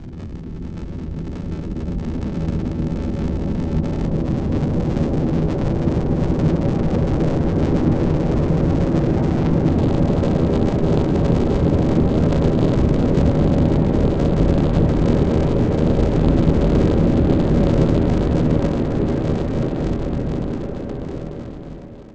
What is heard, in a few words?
PASSING COMET, DEEP ATMOSPHERICS; Sonic Foundry (Sony Creative Software); Vir Unis Infusion